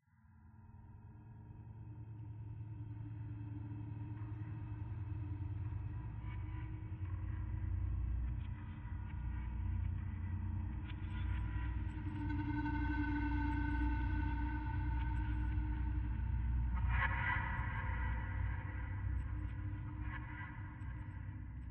Pad

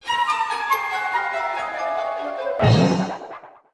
Fanfare! Time Up; PokePark Pikachu's Adventure; Wii

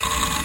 hdd short

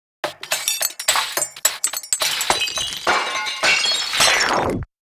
Cooking Fanfare (Failure); The Legend of Zelda Breath of the Wild

